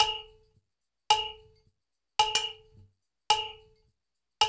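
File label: 108 bpm, industrial udu; Spectrasonics - Supreme Beats - World Dance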